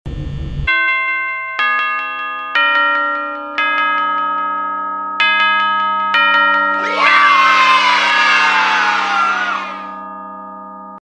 6am jingle; fnaf

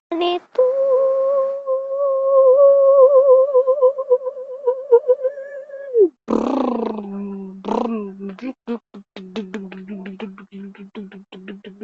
sunegg crashed

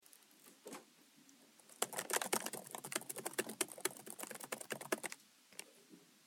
typing

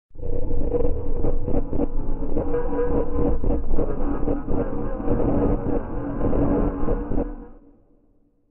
minecraft cave sound

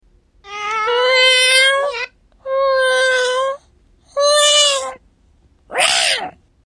meow; trebor

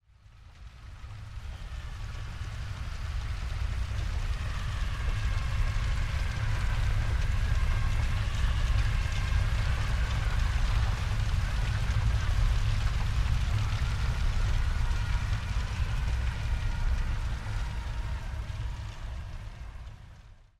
hell screams

